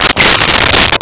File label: sfx pod binder activate